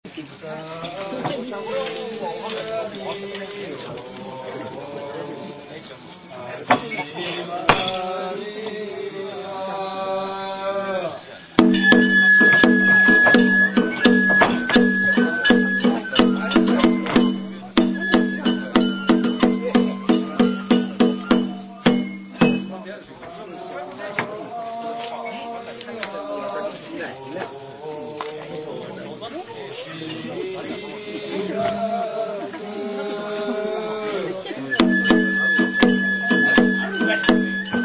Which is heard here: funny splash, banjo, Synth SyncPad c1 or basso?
banjo